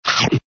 bites on the butt; cartoon sound fx; muttley; dastardly; hannah barbera cartoon sound fx

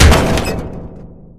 bumper car hit; tf

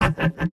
pyro laugh evil; mvm

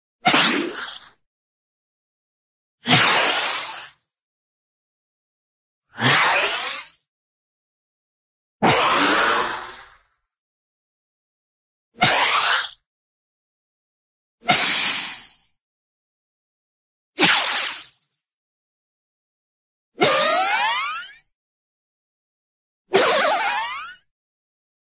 ricochet whoof tube ricco; cartoon

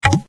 musica menu command; windows 9x; sound schemes

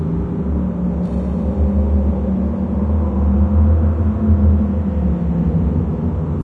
shaft wind loop; video game; Left 4 Dead; Source Engine; ambient

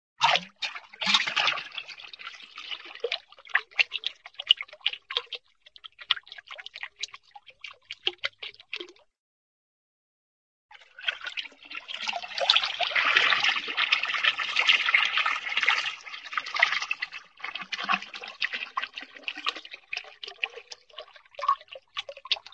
water, flow large tank emptying; cartoon

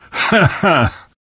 laughter

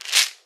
pills deploy, pain pills; player; video games; source engine; left 4 dead 2

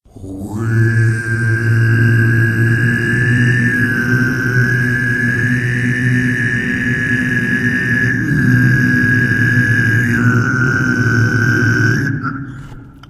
vort pain; Source Engine; video game; npc

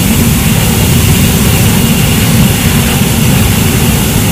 flame thrower loop, weapons; tf

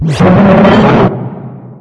dog angry; Source Engine; video game; npc

